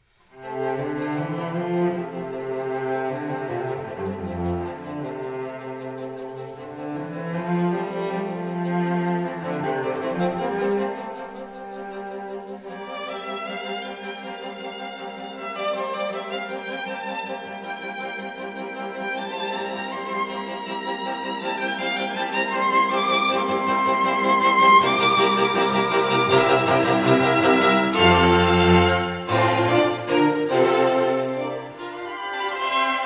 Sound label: string quartet; classical